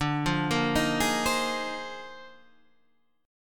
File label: A sharp 11th